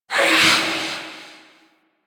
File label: Vocal Melee; Warframe; Wraith